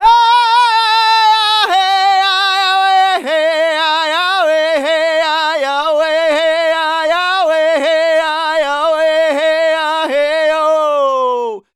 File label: Native Vocals, Native American